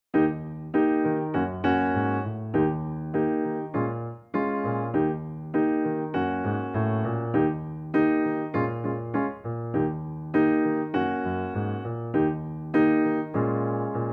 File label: Piano